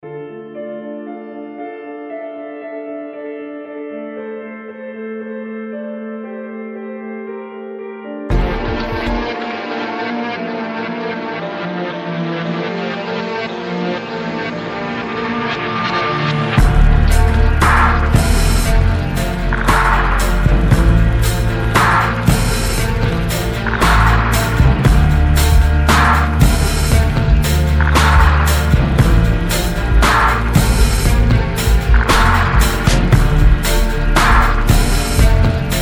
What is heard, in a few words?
Downtempo Darkness